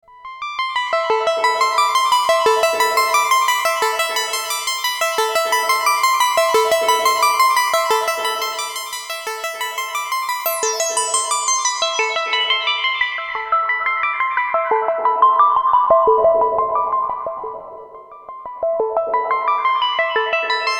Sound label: LXP 1 Juno 60 Arp SMALL1 Dec 4 Del 4